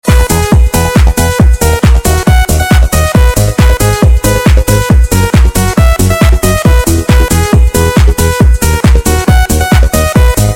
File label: Loop